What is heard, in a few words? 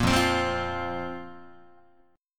F Suspended 4th Guitar, chord; Fsus4 strummed; JGuitar